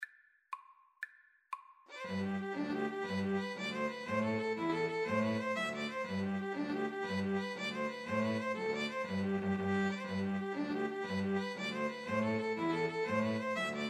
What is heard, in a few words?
irish jig medley, 2 Violins Cello